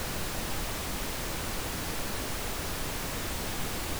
Rumore rosa

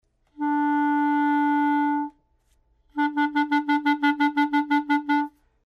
CL Colpo Di Lingua

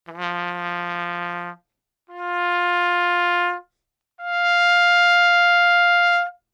Ottave

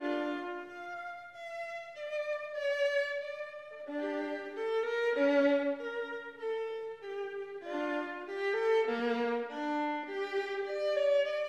Bach ciaccona terza variazione

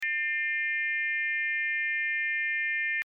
Suono armonico

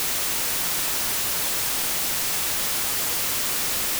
Rumore bianco

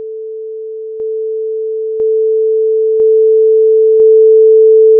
Progressione aritmetica ampiezze

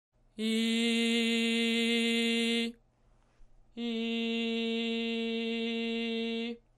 VOX Grave Posizione

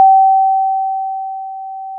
Corda freq

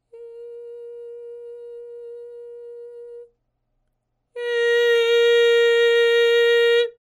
VOX Piano Forte

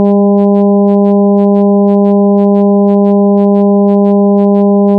Ottava allargata

